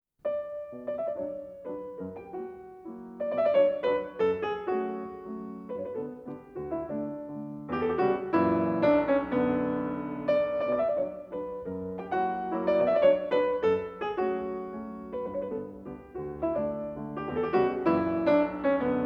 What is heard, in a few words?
Mazurka in B minor, Piano